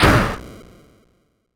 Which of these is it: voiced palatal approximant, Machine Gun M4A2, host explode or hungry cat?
host explode